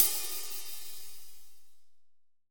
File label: AMB OPHH, Drum Kit, AMBIENCE; AKAI S6000 CD-ROM - Volume 3; AMBIENCE KIT2